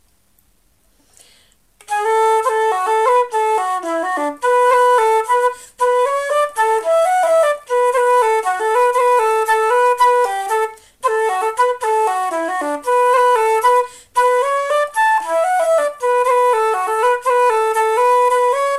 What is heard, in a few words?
polkas slow; Mc Dermott's